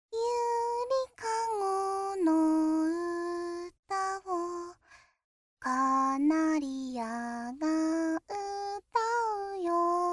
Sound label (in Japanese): 子守歌 効果音無し